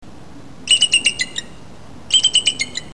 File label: bald eagle; calls; Audubon